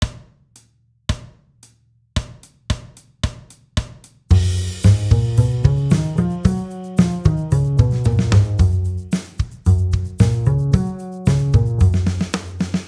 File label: Scales Concert Gb